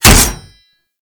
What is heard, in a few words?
Berserker Barrage Hit Metal C; Warframe; Warframes